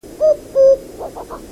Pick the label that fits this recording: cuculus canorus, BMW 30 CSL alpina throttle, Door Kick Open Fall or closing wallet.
cuculus canorus